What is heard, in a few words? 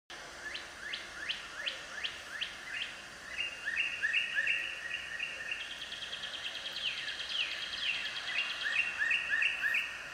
cardinal song